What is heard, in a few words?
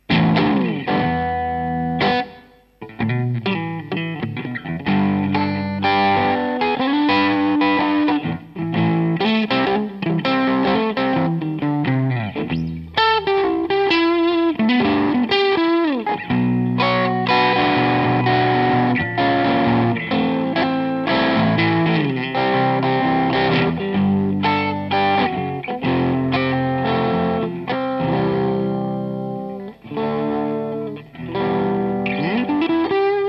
neck bridge parallel bass and treble cut